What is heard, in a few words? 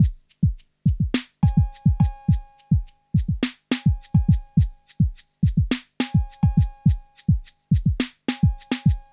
Smooth, R&B Drums